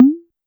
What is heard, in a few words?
Tom 808 Up Fast, Pitch Env Various, Clavia DDrum; Clavia DDrum-AT